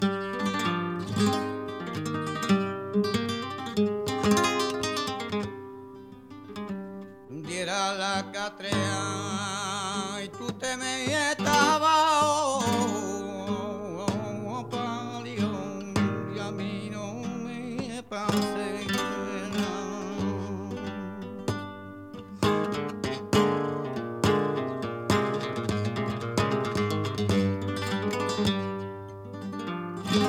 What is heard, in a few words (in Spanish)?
Solea, flamenco; del Sordillo